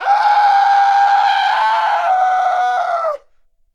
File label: male death; starfighter